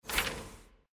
阅读邮件; nba

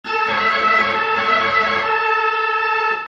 主场风琴上行; 战中; 战斗音效; nba

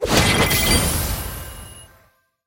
战斗胜利; 战中; nba

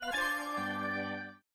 小高潮; 战中; nba

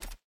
点击按钮音效; nba

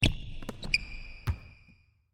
传球, nba; 战中

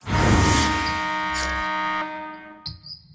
比赛开始吹哨音效; nba; 战中

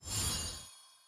球员升级 下方物品出现; 升级 装备 技能; nba

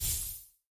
签约完成; nba; 球探系统

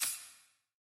球员技能 解锁; 升级 装备 技能; nba

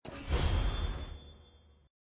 升星成功; nba

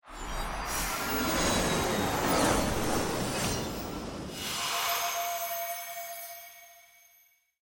抽卡动画; nba; 球探系统

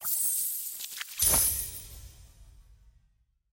训练完成奖励; nba

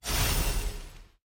满能量释放; nba; 战中

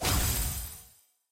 更换战术音效; 战中; nba

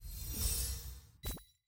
季后赛 奖励飞上去; nba

